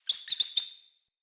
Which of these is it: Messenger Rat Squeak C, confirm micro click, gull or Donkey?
Messenger Rat Squeak C